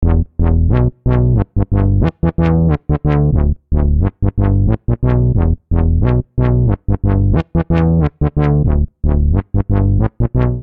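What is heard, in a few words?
E minor 90bpm, Bassline; MIDI Clips; Freak-o-saur; Ableton; Factory Packs; Chop and Swing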